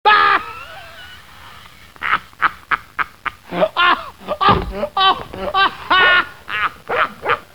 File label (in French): Oh ah oh oh, Rires